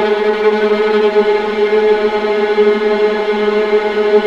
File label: VLA G1 TRM R, VLAS TREMOLO